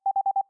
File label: hover